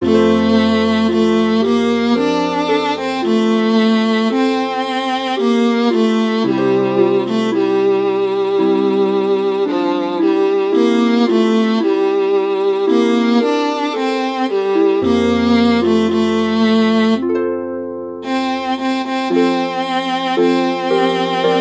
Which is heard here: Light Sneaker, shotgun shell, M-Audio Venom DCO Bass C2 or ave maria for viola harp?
ave maria for viola harp